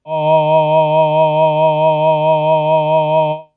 synvox D sharp2, Synthesized Male Voice

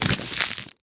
Paper Scrunch; ModeAudio Found Percussion Hits

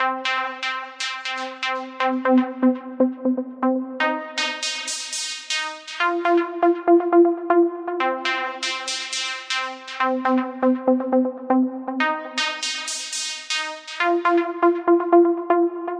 Melody, 120BPM; Breathing Circle

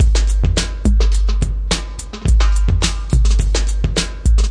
106 bpm 808 vs funk kit, drum loops and breaks; 101 to 110 bpm

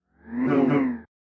up n down; Ze Franks Pain Pack

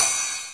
hi ride

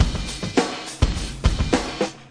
105 compressed, drum loops and breaks

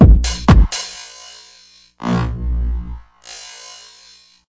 hard house with stretch

